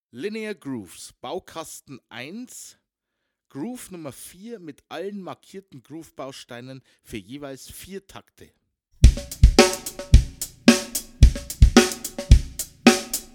Baukasten 1; Schwarzes Album; Bum Bum Tschack Grooves Fills

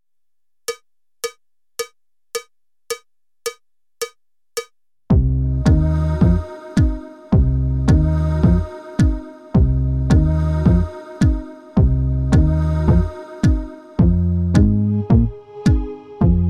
Puls-Track; Bum Bum Tschack 1

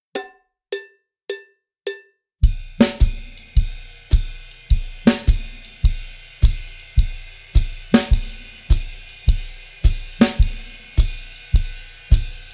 Swing-Phrasierung; Swing-Phrasierung 3; Schwarzes Album; Bum Bum Tschack Jazz Latin

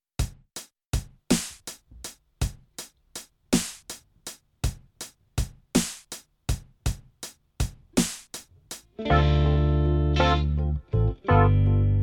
Triolen 12/8-Feel; Bum Bum Tschack 1

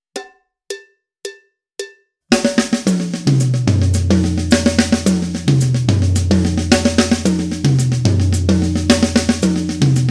16tel-Vokabeln; Schwarzes Album; Bum Bum Tschack Grooves Fills

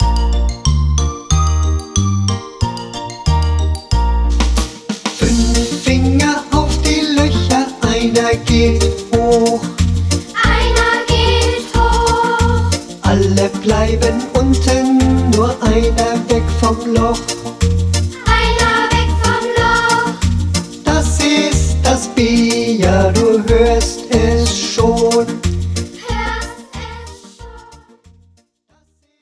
Das B; Floetentoene; Meine ersten Floetentoene 2

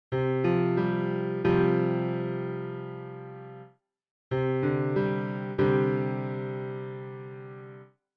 Sus4- & Sus2-Akkorde, Bass; Bass Unlimited

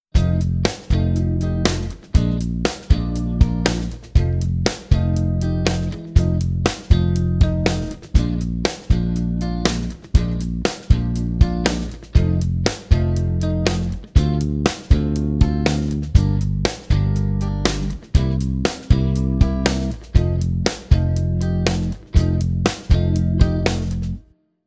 erweiterte Blues-Form, Bass; Bass Unlimited

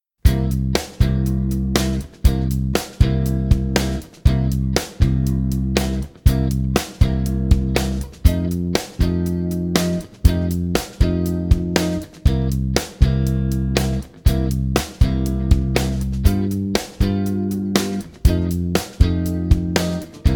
Blues-Form, Bass; Bass Unlimited

